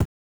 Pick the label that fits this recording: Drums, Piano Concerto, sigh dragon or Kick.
Kick